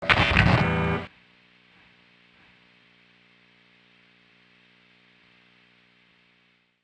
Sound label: Guitar pickup; Instruments; Zomboid; Lifestyle